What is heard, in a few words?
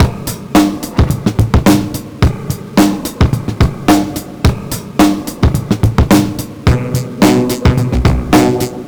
108 bpm, Breaks; POTBreaks